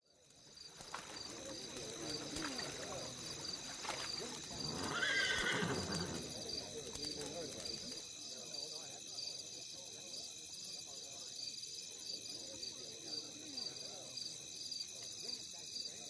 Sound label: Ambience